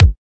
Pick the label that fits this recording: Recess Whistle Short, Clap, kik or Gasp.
kik